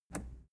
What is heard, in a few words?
plastic button scratch release enable